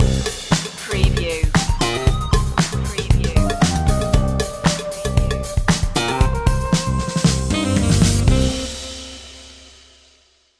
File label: soul fusion stinger